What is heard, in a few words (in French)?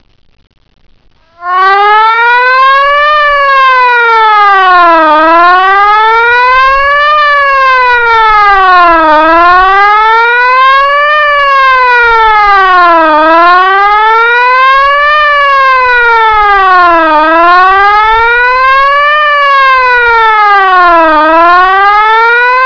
debut d alerte; PPI